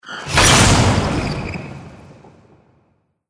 Overheat; Ember

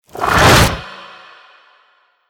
Frost Ability Cast

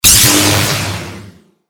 Volt Shield Cast

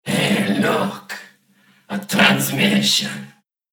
Incoming Text

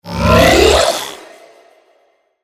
Ability Cast; Oberon

